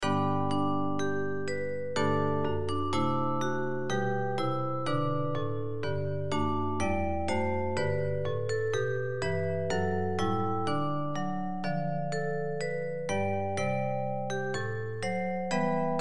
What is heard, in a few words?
Bells